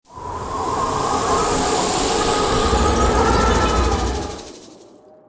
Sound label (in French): tempete; MOBICLIC148